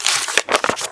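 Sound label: book page; The Elder Scrolls III - Morrowind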